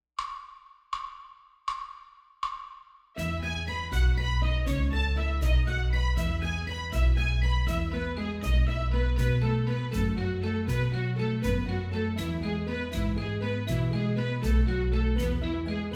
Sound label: Enharmonic Lambs